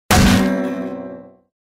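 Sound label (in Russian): гитара поломалась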